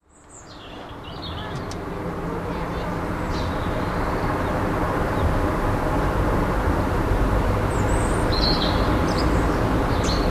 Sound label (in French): Rouge-gorge dans la ville